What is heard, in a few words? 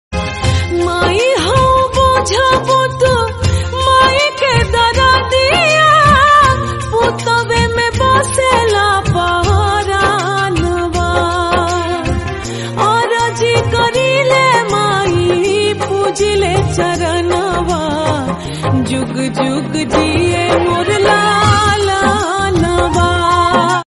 Bhojpuri Song; ringtones